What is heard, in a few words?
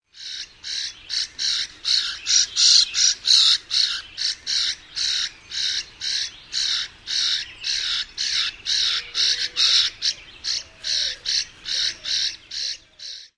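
immature call, European Starling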